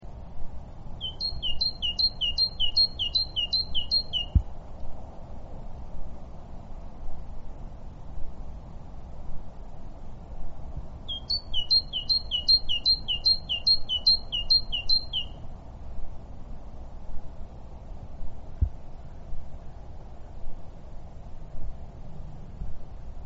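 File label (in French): Mesange charbonnière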